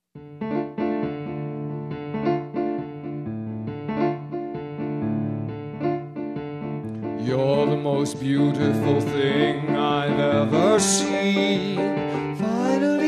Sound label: Tango Duet; Sappy; Musical